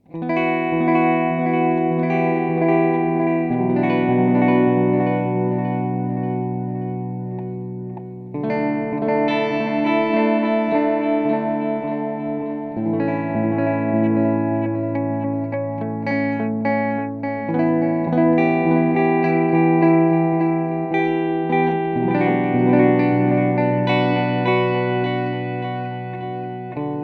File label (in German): EQ mehr Mitten; Free the Tone Future Factory